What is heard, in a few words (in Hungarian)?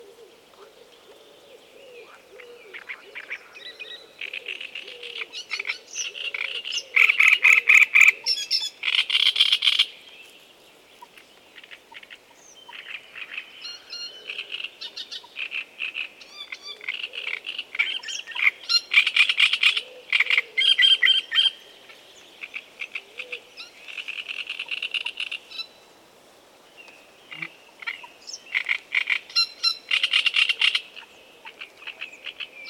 nádirigó hangja